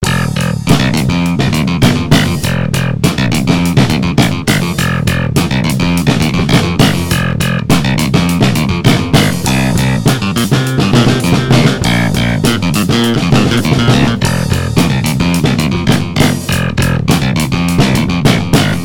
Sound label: Clavinet Jam